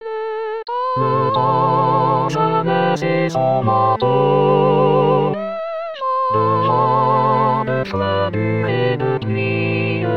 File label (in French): printemps tutti; 2018 st jean